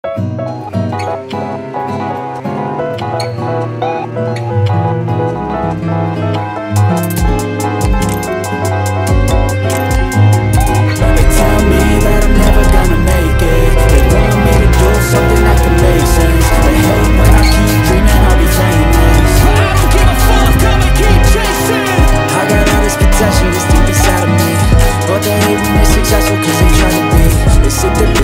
new hindi movie ringtone music; sita raman

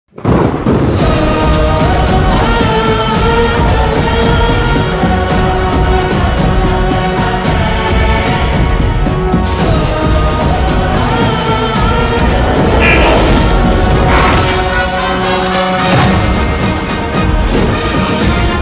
bgm; Kathanar the wild sorcerer; jayasurya; anushka shetty; rojin thomas

